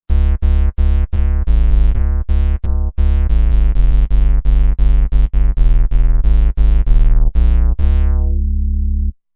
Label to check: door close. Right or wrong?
Wrong